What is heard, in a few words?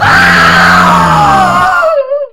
jumpscare; one week at flumptys beta 3d